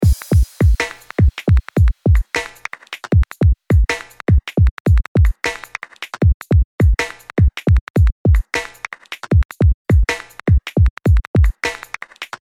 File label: Drum